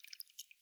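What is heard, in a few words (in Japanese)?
くちゅ 短, 水音効果音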